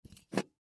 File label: Hairspray Can Up, Hairspray Can Pick Up; Hair Salon Barber Household; Primping; foley; Human; Blastwave